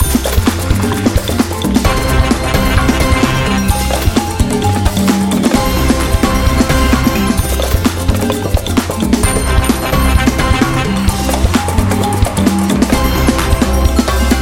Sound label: loop, jackpot; wbg games